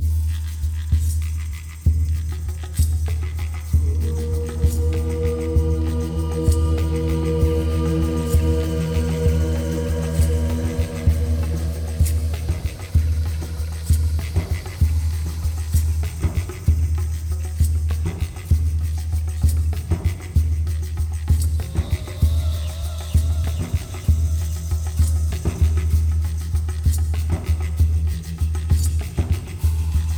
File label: Percussion; GRINDSTONE PercussionCRYPTS